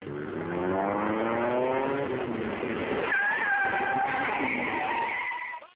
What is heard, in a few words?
screech